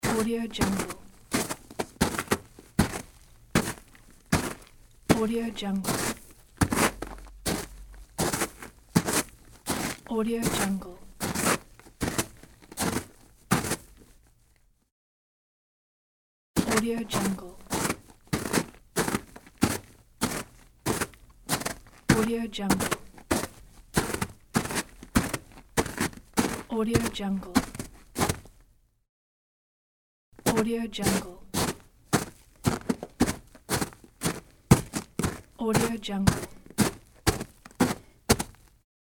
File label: walking on snow; medusamusiclab